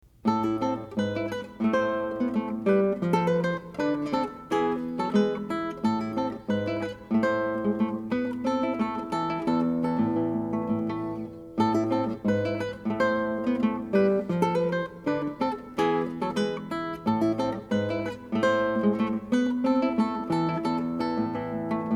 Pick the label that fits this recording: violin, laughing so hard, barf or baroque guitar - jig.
baroque guitar - jig